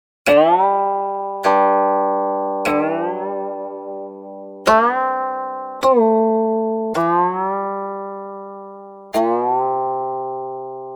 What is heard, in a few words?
guqin